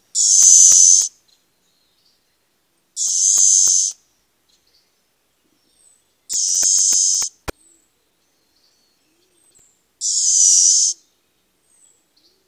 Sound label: Flame-throated Warbler